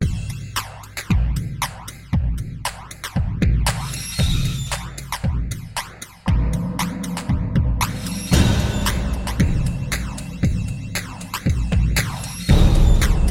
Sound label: Metal Cage, bang on a drum